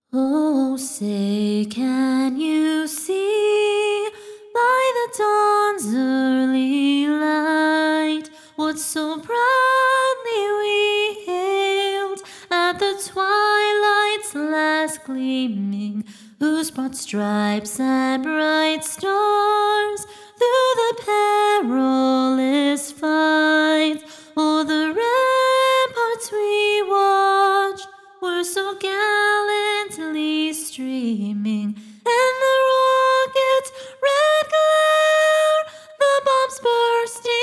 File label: Star Spangled Banner (Acapella)